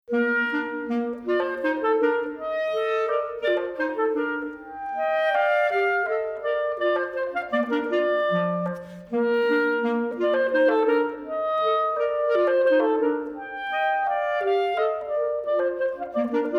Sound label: CLARINET CLASSICA - Duo KV 487 Menuetto